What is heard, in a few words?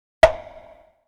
electronic bonk; Asian Show Sound Effects